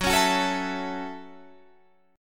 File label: A 6th, F sharp, chord